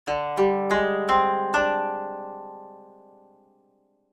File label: Koto